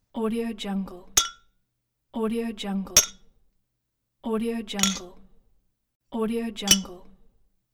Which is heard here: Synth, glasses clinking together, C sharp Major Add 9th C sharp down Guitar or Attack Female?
glasses clinking together